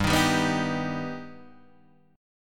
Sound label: G Augmented G Guitar Standard; G+ strummed; 3,6,5,4,4,3; JGuitar